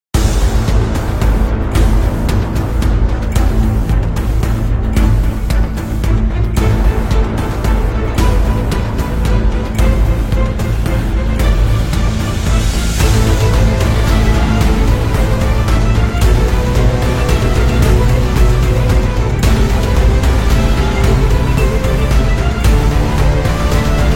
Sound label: Battle Theme; Canto Iv Battle Theme E1